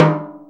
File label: Timbale Low; GMPercussion; 3DO; Toolkit 1.5; GMPercussion44k